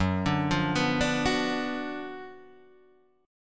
F sharp Major 7th Suspended 4th Sharp 5th, F sharp, down, Guitar, Standard; F#M7sus4#5 arpeggiated